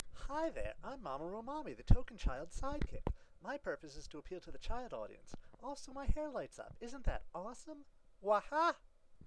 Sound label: Child Audience